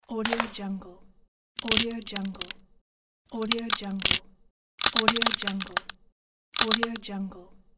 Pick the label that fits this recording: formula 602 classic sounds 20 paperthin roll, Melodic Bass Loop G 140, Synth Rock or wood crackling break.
wood crackling break